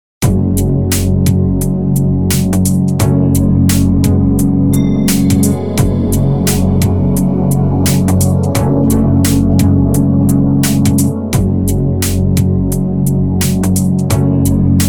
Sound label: Stereoizer Fullmix